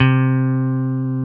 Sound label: BASSES, Roland